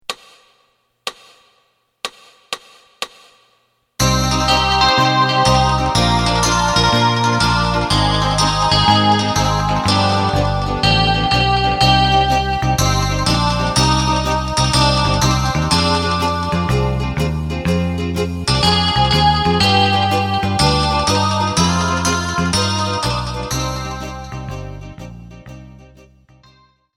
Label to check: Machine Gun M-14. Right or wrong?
Wrong